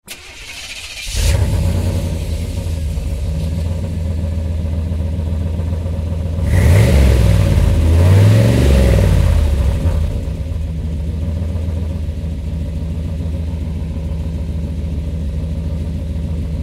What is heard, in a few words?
Vehicle